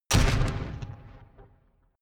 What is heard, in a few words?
flutter echo; whooshes rises; hits impacts